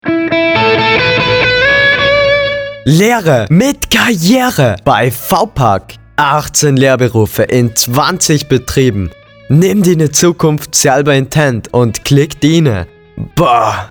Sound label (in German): Spot kurz mit Hymne